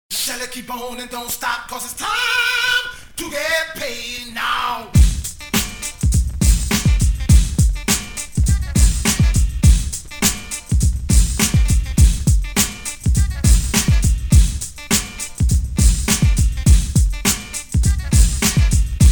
Instrumental

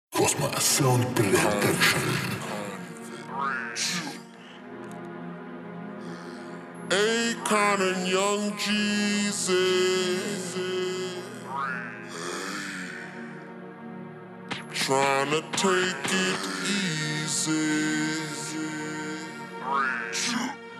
33-37 Hz Screwed Low Bass

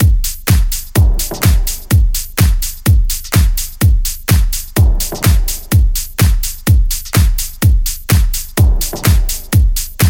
Dancefloor Bass

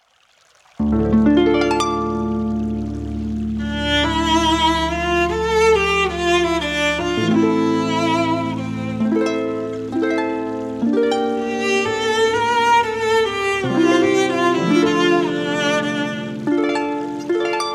Orchestra Chorus

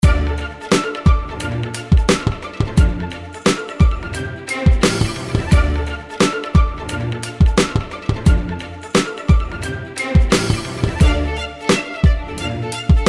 Instrumental

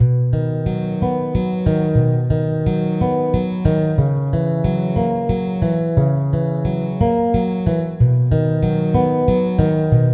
Guitar Relaxing

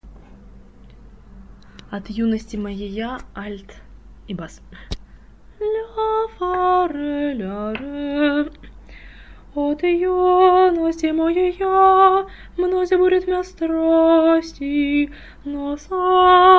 alt bas

